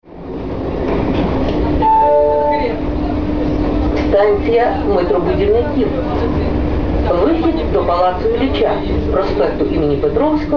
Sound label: metal; metrobud; dnipropetrovsk